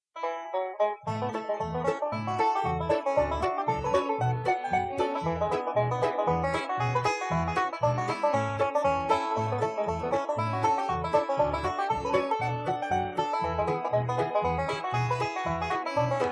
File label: Dustys Hornpipe, banjo